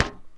footsteps mech

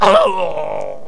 death; player

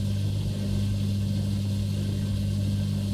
machinery drone

